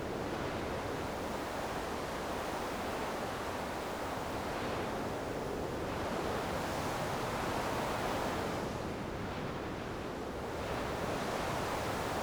ambience desert; Rust

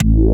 adrenaline gain

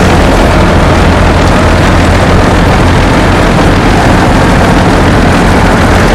Vehicle Engines; Red Orchestra Ostfront 41-45

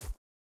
wolf footstep grass hard; Rust

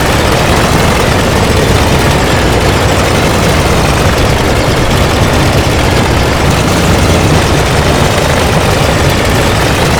helicopter damaged; Call of Duty Modern Warfare

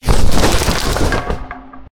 objects, World of Tanks

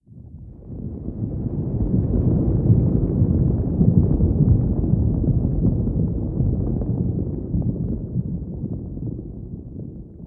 earthquake rumble; Call of Duty Modern Warfare

